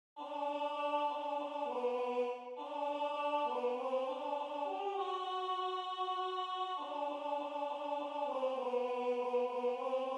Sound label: Alto